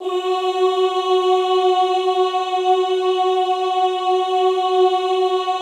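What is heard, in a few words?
OOH F#3 right, classical choir; Best Service; Extended Classical Choir; OOH MIX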